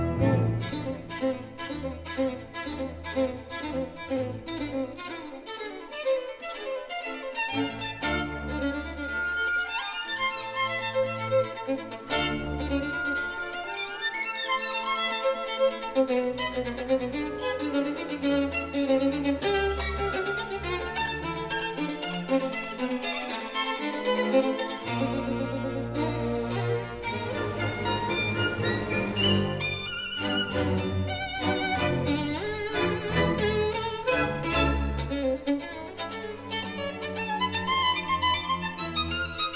Violin Concerto in A minor, Allegro con fuoco; Vieuxtemps; Violin Concerto No. 5; 'Gretry'

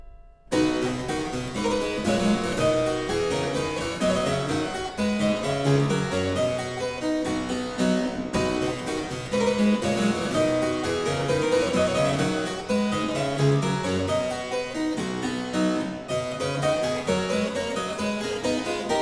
Aria Variations in C Major, Harpsichord; More Palantino

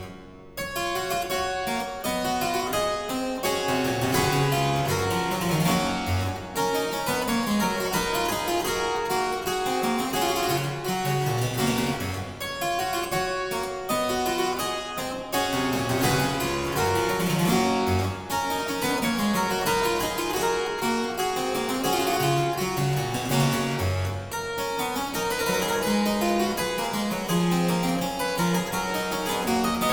Aria Variations in G Major, Harpsichord

